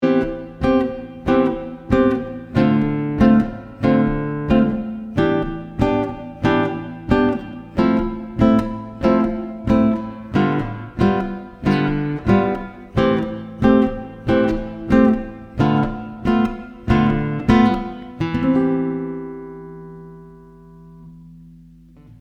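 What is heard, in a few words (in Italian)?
Esercizio, Chitarra sola, pratichiamo con l'accompagnamento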